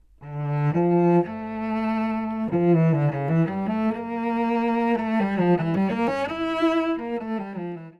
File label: cello